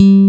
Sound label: PHAT GTR BASS, Synthsonic; Pro-Rec - Synthsonic; BASSES